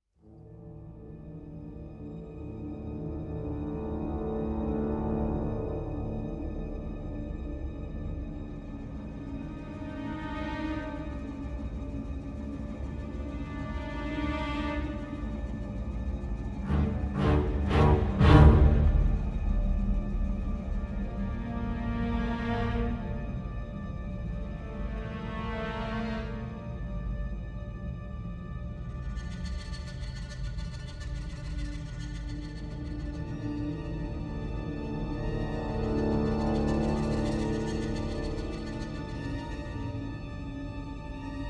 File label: Ambient